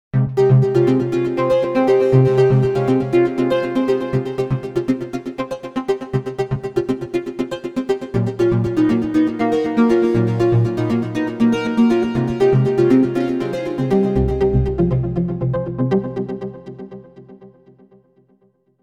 Instant Arp, v-synth

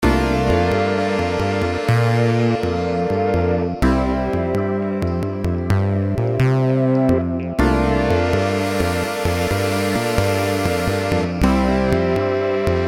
Magic Shower, v-synth

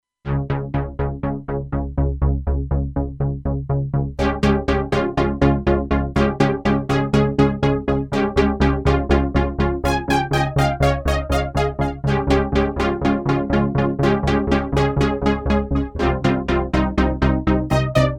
Pulsatron, PAD

